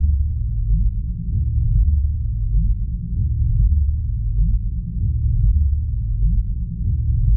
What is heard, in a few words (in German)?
WASSER; Best Service; Advanced Mega Effects